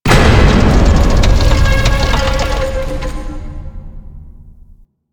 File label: Vaults Plataform Start New; Warframe; Entrati